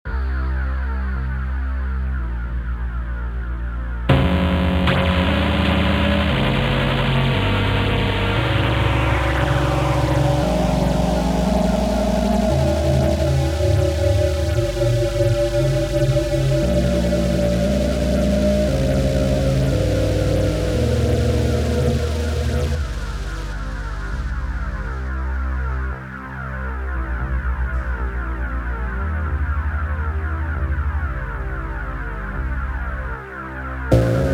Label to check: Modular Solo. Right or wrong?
Right